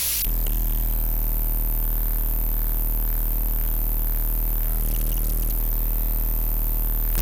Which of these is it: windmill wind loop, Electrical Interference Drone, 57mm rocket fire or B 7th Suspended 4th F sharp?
Electrical Interference Drone